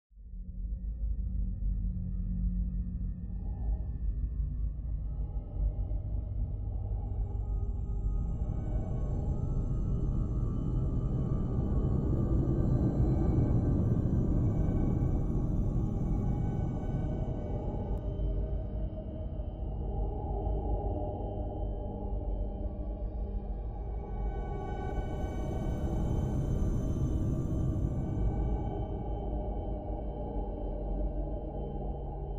Cave Atmos

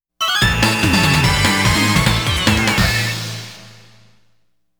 Good Clear!!

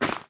Ground short

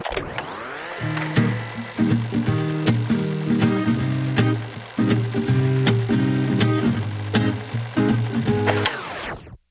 Stinger Busking Guitar

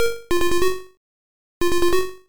Next Stage Start; Clean Sweep; Vectrex

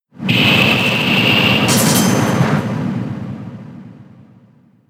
Icy Wind; Pokemon; gen 6; XY; ORAS

